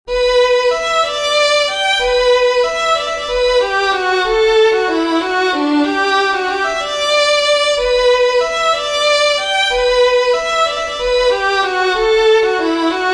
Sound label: DAWN WALTZ, fiddlers; peifiddlers